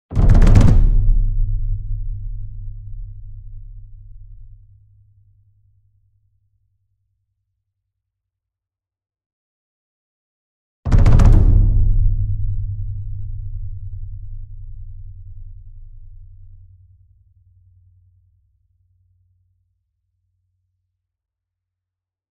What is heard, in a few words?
ENSEMBLE DRUM Bass Drum Concert Large Roll Near; boom library; Cinematic Strikes Construction Kit